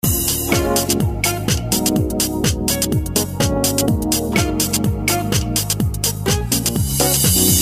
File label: sweet ringing tone